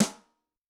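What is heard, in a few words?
Brush Rim, snare; Yamaha DTXpress-II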